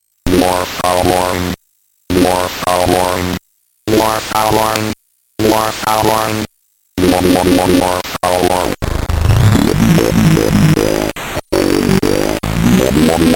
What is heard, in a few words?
wave only speech wave bug industrial circuit bent gameboy; wave only 3; weeklybeats-2018